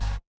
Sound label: feedback error